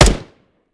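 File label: weapon fire player; gmod; viper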